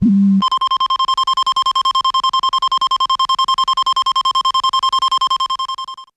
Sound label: alarm